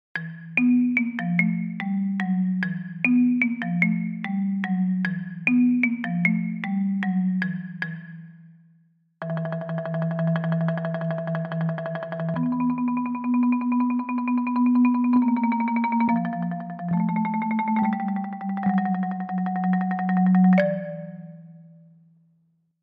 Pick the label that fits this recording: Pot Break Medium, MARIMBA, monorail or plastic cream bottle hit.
MARIMBA